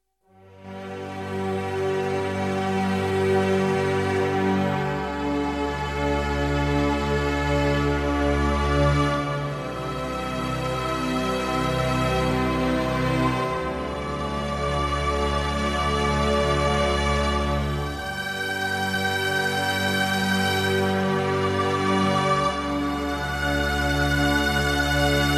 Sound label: 432 hz